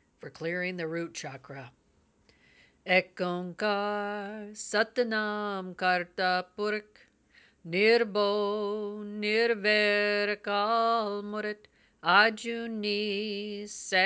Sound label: mantra clear root chakra